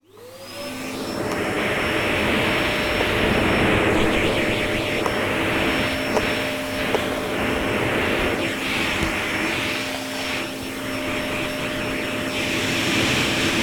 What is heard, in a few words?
vacuum cleaner on use hose close to microphone off, household